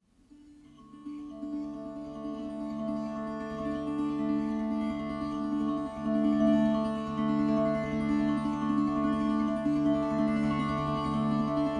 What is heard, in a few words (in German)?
Tamburina Stimmung a d d d